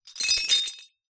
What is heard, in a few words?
plate dish ceramic broken pieces set down on ground